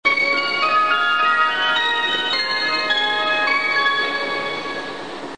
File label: bus chime; 富士急バス